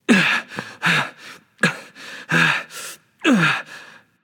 breath; smite; susano

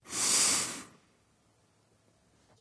sniff; odin; smite

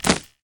death drop whip; smite; osiris

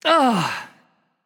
Ugh; smite; susano

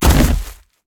land; smite; cthulhu

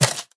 footstep; smite; arthur skin9

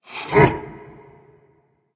grunt; smite; geb

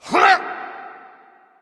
grunt; smite; guan yu

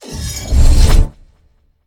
pwr lg prefire; smite; god atlas

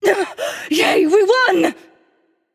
"yay"; smite; nuwa

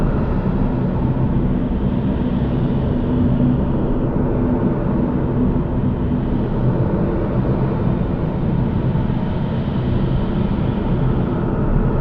victory portal; Smite; Cerberus

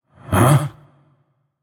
surprised; smite; gilgamesh

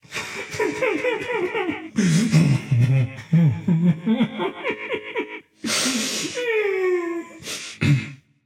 boohoo; chaac

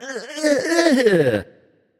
nv ugh; smite; voicepack; ganesha

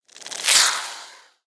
pwr fire; serqet; smite

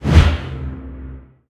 small swing; sobek; smite

